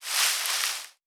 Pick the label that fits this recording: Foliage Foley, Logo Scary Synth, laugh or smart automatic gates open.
Foliage Foley